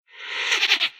the forsaken laugh

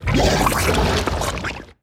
burst large; fistula

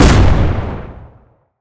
bullet shot

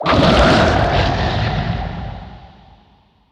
beast distant splash

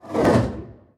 death horse whoosh

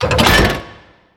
metal door open

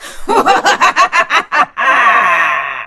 evil laugh

